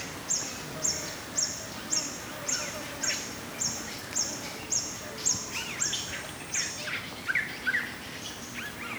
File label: birds forest